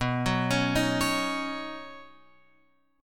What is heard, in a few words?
B Major Add 9th B down Guitar Open F, Badd9 chord; arpeggiated; JGuitar